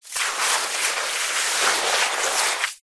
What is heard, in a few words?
wet sand hand movement; foley; zapsplat